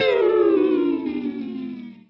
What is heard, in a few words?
Slideguitar Downwobblie